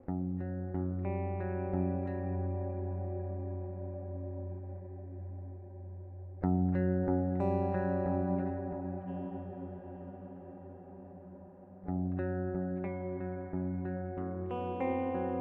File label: Eventide Blackhole Dark